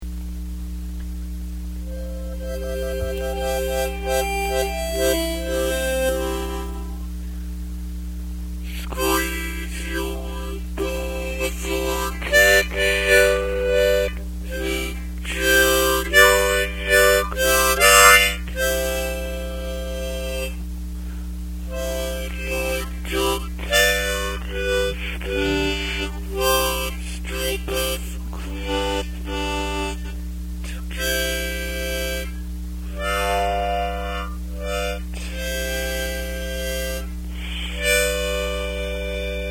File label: harmonica; foam rubber